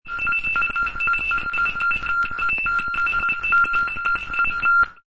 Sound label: Glitch